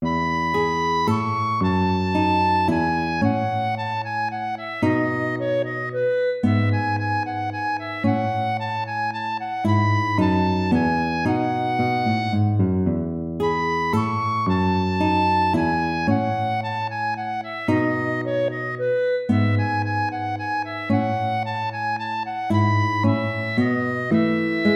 minuet major

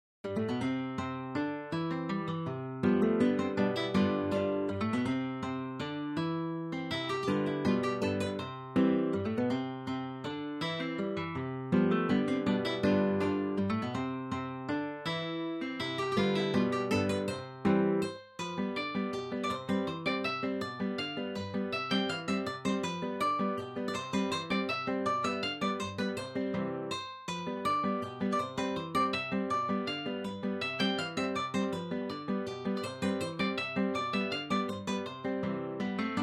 waltzes

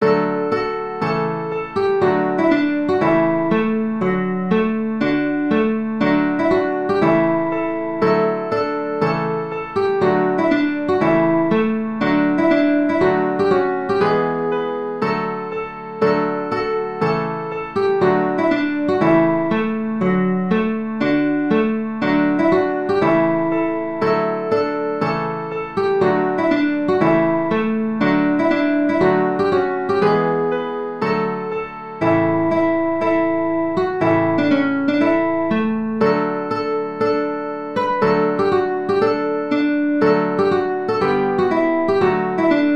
trumpet tune

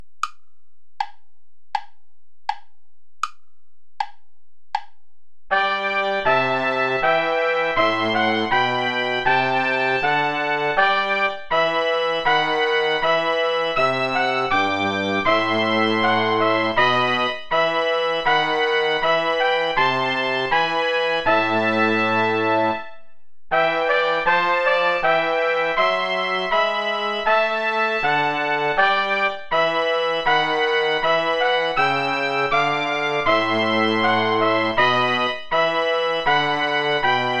play along without trombone; reger max

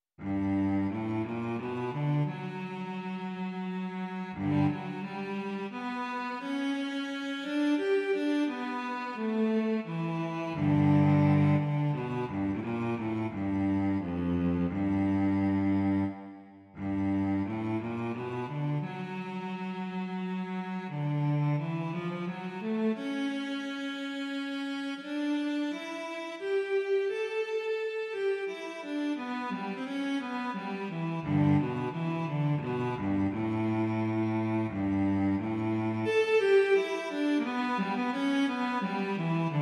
intermezzi larghetto

